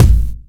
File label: present kick drum single shot a key; kick drum samples